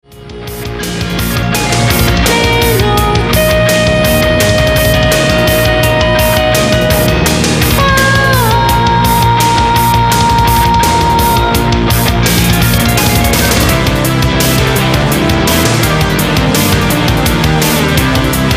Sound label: Sky Melody